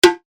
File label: lo cow bel